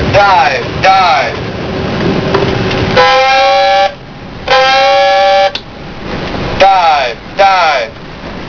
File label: dive; Submarine - Diving Sequence - Unknown 688 class Submarine diving (AN/WIC-2)